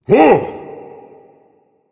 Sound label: surprised, voice; Smite; ymir skin6